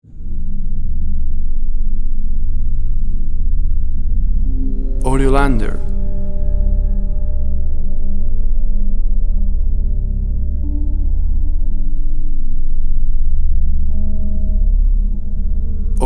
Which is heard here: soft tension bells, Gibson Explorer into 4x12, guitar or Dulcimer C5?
soft tension bells